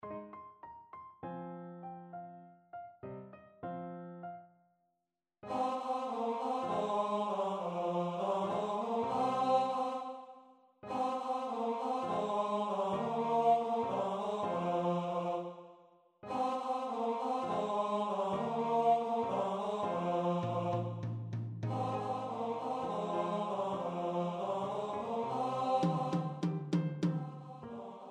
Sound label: Riu Riu Chiu chorus